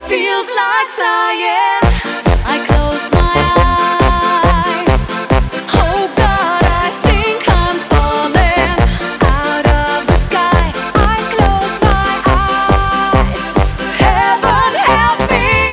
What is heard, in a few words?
BEAT